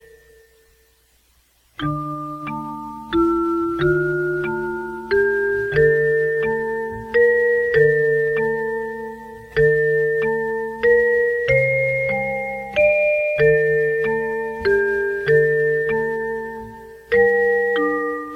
piano

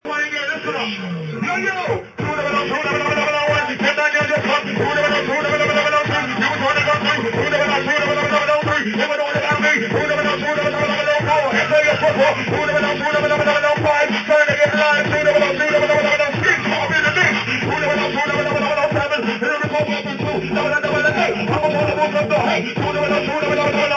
DnB; miami

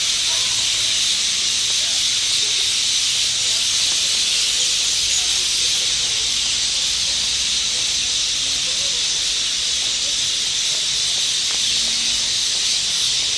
birds nesting in port douglas

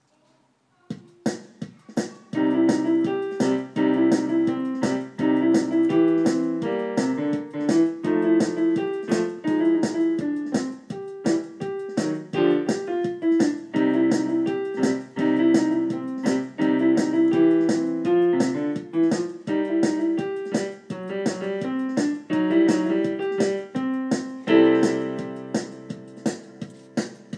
simply jazzy

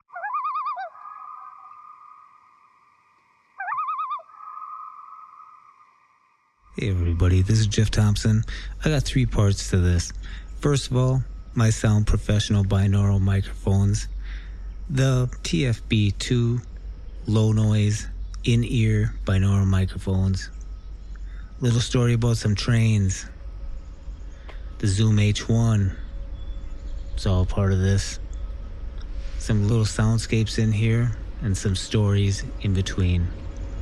trains; zoomh1

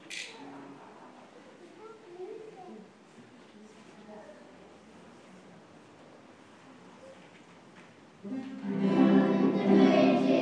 primary guitar group; mount street

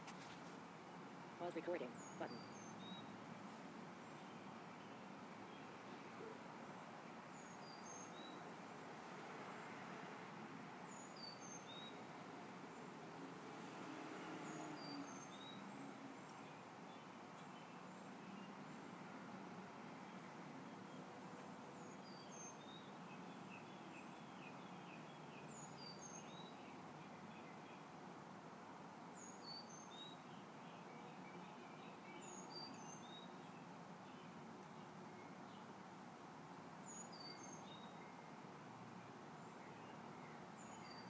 carolina chickadee

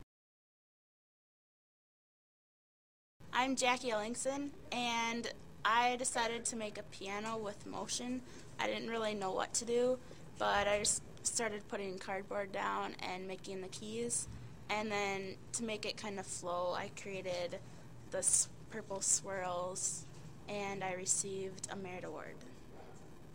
three dimensional piano

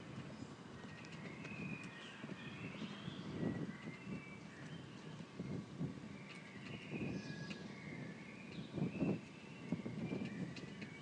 union flag flapping and bird song

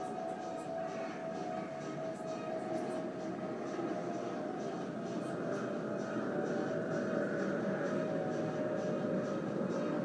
it's a disco outside all night

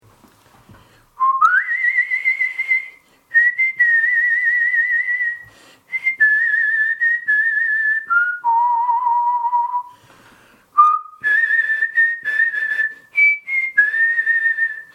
vibrato whistler

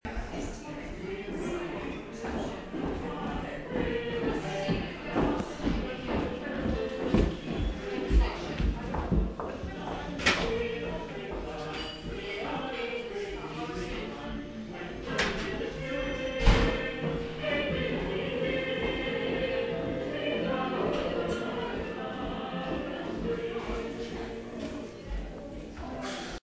choir practice; rsc

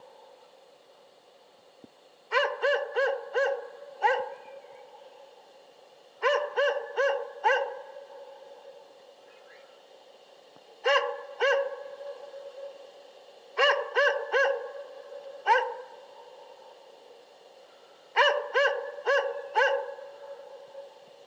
dog barking; for noise action week

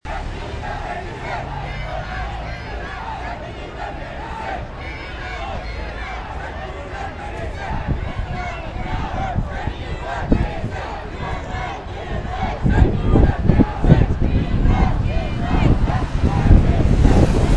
Tamil protest; amazed it s continuing

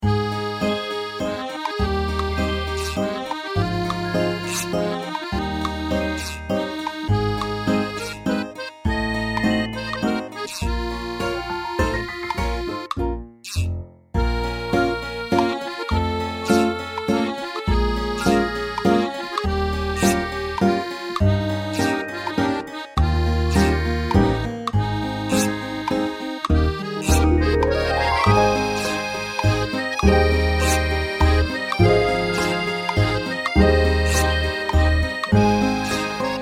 3 4 waltz

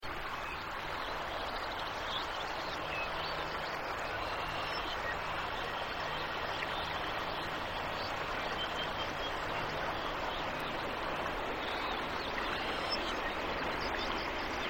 birds washing over the water

